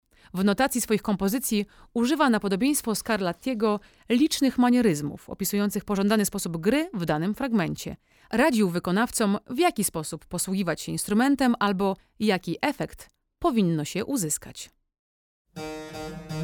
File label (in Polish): TOCCAT na klawesyn solo; Studia Nagrań w Operze i Filharmonii Podlaskiej